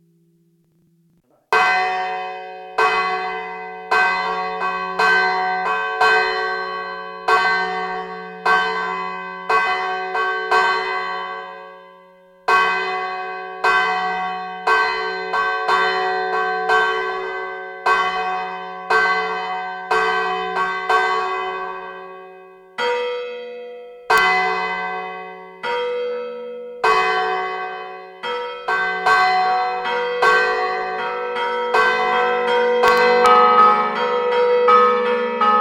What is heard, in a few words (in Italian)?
Campane, Rezzo Santuario; Imperia; Liguria; Archivio Balma Campane Liguria; Polifonia